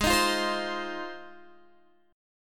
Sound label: Ab 6th Ab, Guitar, Open G